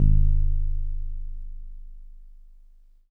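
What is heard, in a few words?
Wood Piano DX100 G#1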